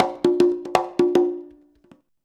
CONGA 119, Modern Percussion Loops

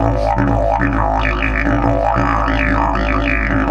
130, Didgeridoo

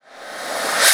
Reverse Fx; musicradar

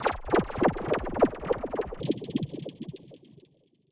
Throbb Drop; transition

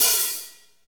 HAT, Drumscapes Roland

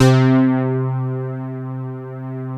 POLY OBIE C2; USB Soundscan vol.49 - Analog Fantasies; AKAI

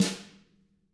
HIT, Drums, SNR; CAMCO

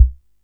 Kickdrum, Korg MP7

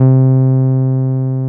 TB-303

